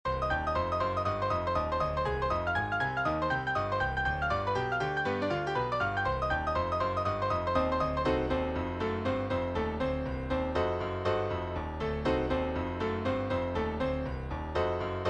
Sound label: Player Piano